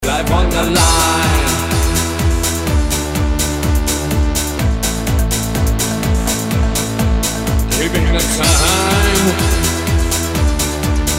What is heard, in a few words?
dance trance mix remix